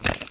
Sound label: switch; Ace of Spades